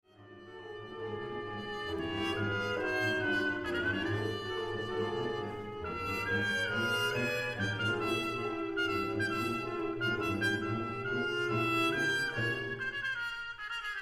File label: Trumpet Concerto; Gala Concert 2009